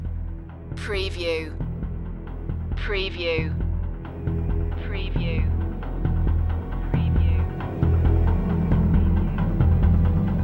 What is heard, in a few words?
underworld series dramatic cue